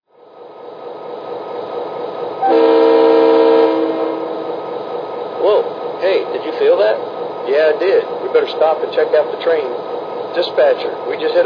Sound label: sd40 2 3ch